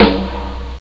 snare drum, percussion instruments; Sound Effects for Multimedia - Volume 1

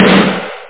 raspy snare

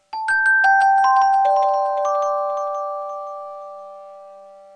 BELL; Musical Instruments (Simply Media)(1999)

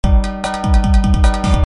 gameover; VenusMission; Aventura

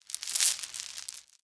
dance; Immortal

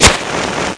match start; MCULTURE; DigiZINE

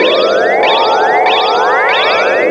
toy; Word Wizard Games

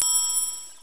Bells

